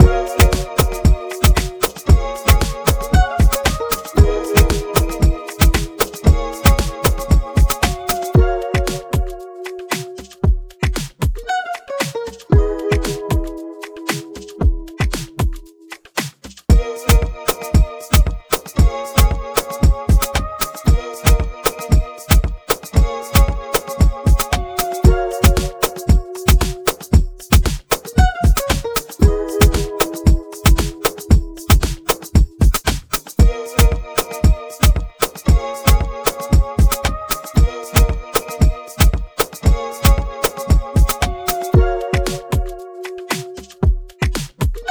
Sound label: Drumming In the City